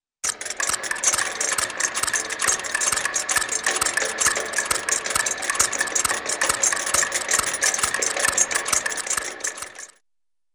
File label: Several Different Clocks Ticking; Thought Process; Hollywood Edge; Cartoon Trax